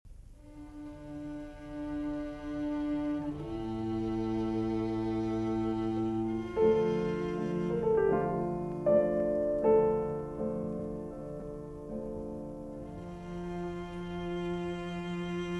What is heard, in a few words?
Piano Trio No. 5 in D Major II. Largo Assai ed Espressivo; Op. 70 No. 1 Geister-Trio